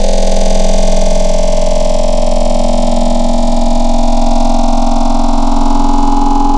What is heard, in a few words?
Half of a Square, Wavetables; Cymatics; Nightmares Vol 2 Wavetables; Serum